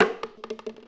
Hit Plastic Popcorn Bucket; SFX Kit; Foley - Misc

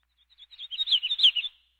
Birds

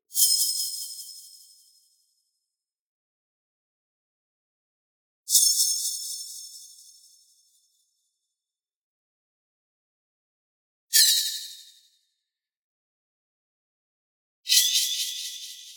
BLING Harsh Freq Shift Delay; boom library; Anime Essentials

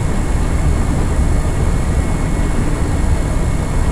Machine Generator Loop

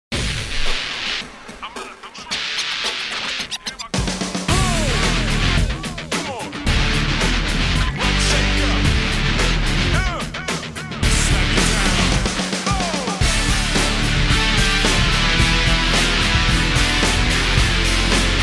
rock

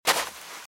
Footsteps - Sand - Dry - Walk - Left

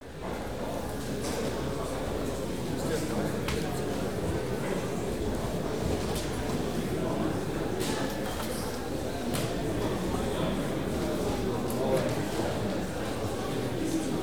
school students; best service Blue Box; crowds interior

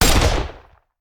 Machine Gun; Sound Ideas; Guns

